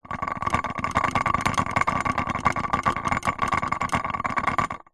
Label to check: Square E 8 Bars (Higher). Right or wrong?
Wrong